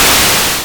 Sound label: static